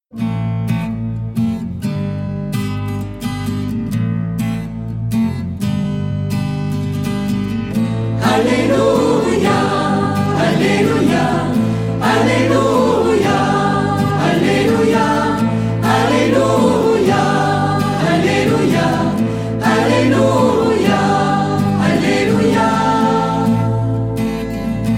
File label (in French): Alleluia de la messe; St François Xavier